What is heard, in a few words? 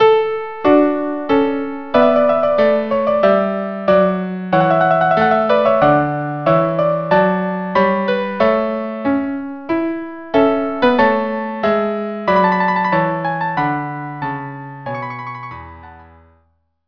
solemn march trio